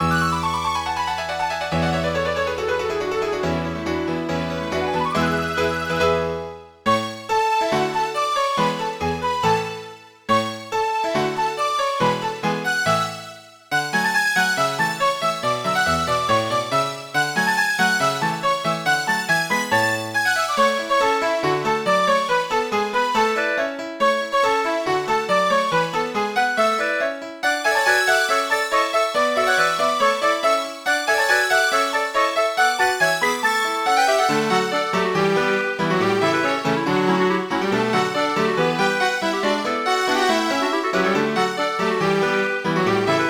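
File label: background music map simple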